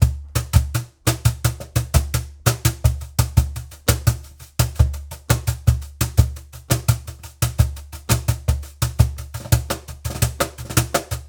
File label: 170bpm Cajon pan right